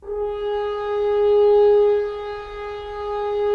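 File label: Solo French Horn, Brass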